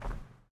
step concrete

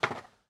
tap

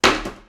snap

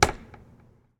jump

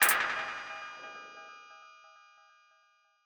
objective win

